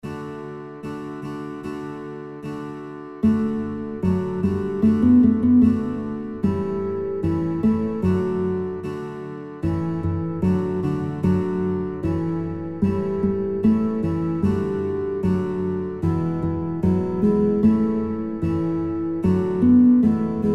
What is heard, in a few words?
Guitar, kinderlieder gitarre playalongs; Guitar Songbook; Kling Gloeckchen Klingelingeling